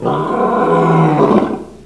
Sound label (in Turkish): arslan kükreme, hayvanlar